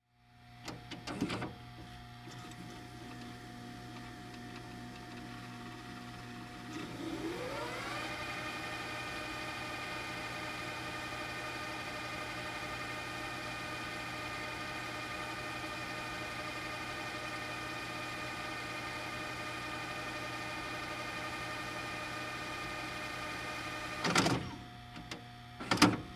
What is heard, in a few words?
Vcr Rewind Tape; Technology; Tape Players; Electronics